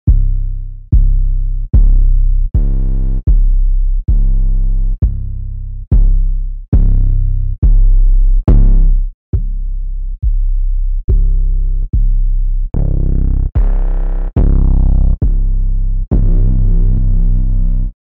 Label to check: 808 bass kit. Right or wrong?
Right